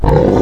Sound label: Pig, Animals Wavetables